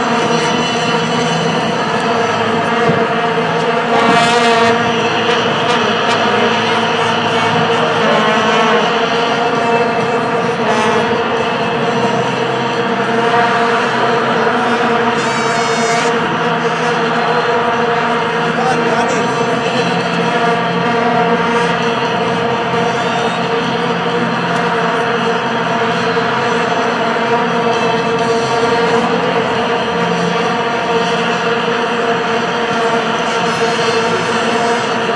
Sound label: inside soccer city bee hive